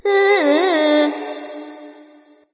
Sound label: tongue light; smite; voicepack; hel